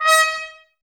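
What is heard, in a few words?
Brass trumpets D#, Mute; Miroslav Vitous - Woodwind Brass Ensemble; Tps Mute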